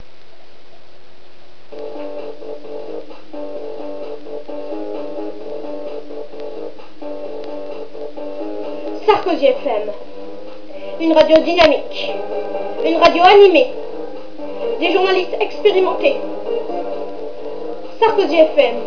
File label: jingle